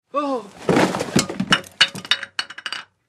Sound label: Male Faints Collapse